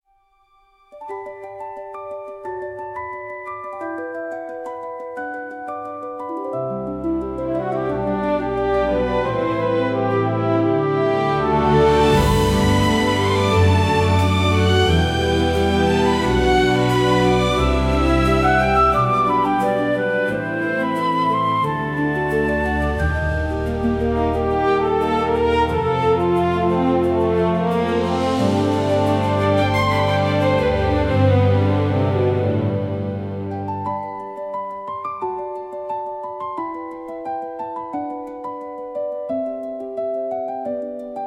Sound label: stately orchestral; guest intro